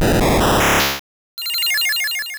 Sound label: Synthesis; sfx; attack moves; pokemon; gen 2; gsc